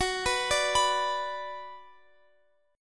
F sharp Suspended 4th Sharp 5th, Guitar, Open F; arpeggiated; x,x,18,18,17,18

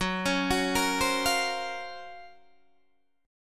F sharp Major Sharp 11th, Guitar, Standard; F#M#11 arpeggiated

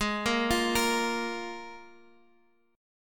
F Minor Double Flat 5th

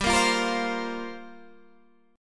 F Suspended 2nd Suspended 4th G

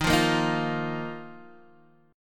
D sharp Suspended 2nd, Guitar, Open D

